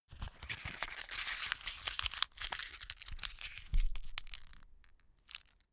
notepad sheet crumple

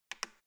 embedded button press quick

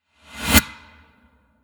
reverse suck in short reverb

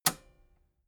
metal button robust spring release disable

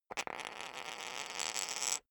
poker chip spin short

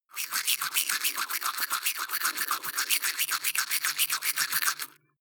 teeth brush dry closed front

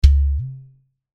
drum hit

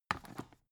dvd case put down

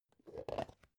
plastic bowl small open rough slow

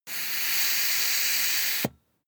air pump ball hard

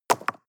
stone drop clack

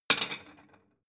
plate small shelve slide hard

